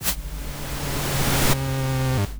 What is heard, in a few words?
Misc Noise